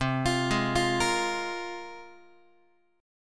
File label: C 7th Suspended 4th Sharp 5th, down, Guitar; arpeggiated; C7sus4#5; x,8,8,6,9,9